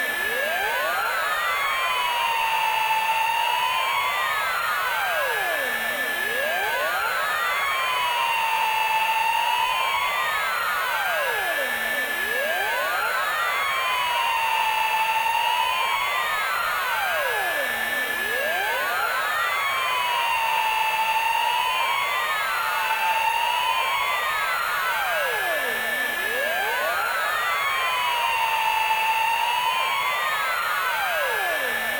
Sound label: Low Health Loop; Void Bastards